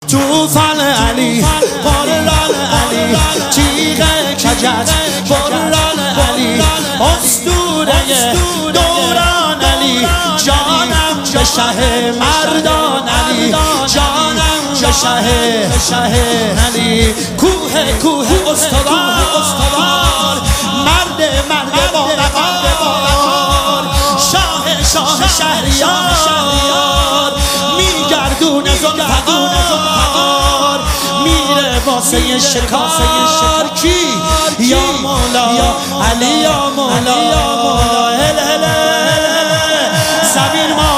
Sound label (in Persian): مولودی